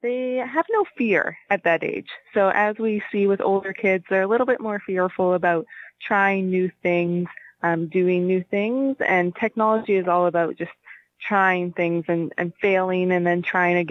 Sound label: Tuba